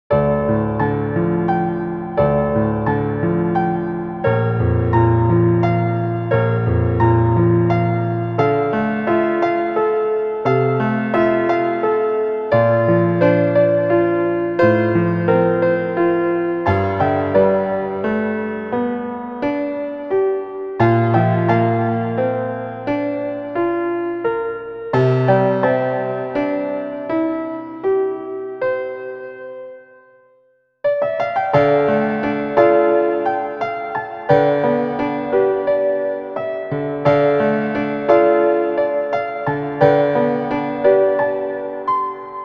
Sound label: Theme of Bloodstained, Piano; Bloodstained: Ritual of the Night